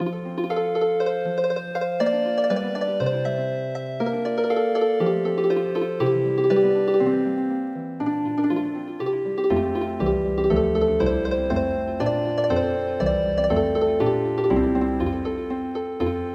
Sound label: Healing Harp, traditional